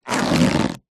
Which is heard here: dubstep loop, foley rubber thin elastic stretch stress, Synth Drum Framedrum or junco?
foley rubber thin elastic stretch stress